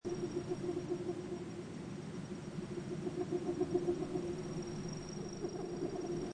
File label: Jurassic Atmos Loop Short, Jurassic fantasy swamp ambience; Seamlessly looping sound. Short version; Jungle